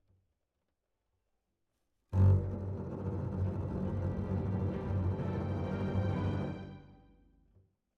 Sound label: Cbasses